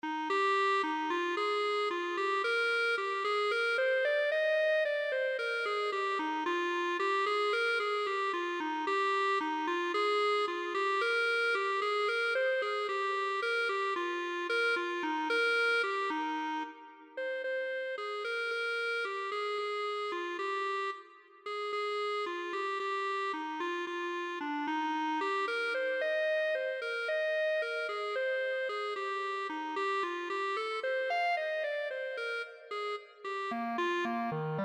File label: Clarinet